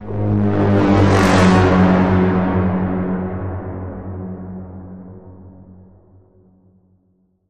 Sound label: Bend, G sharp